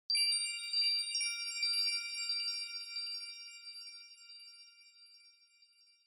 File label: Onoma Sparkle Mid; オノマトペ キラキラ